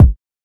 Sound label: subtle reverb kick sound b key; kick drum samples